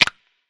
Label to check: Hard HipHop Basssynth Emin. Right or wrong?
Wrong